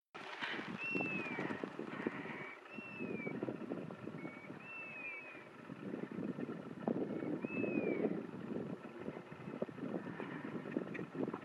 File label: Bird audio